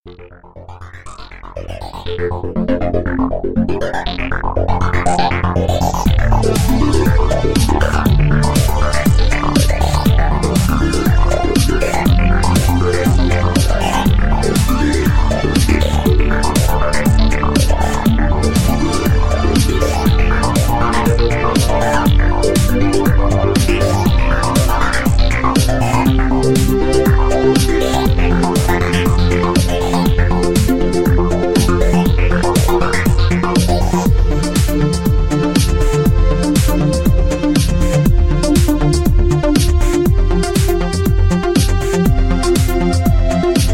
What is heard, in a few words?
Electro Waves; a few synths to make the waves dance and the compass go wild. Tied to the mast, I await the sirens with bated breath...